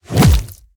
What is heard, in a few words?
weapon hit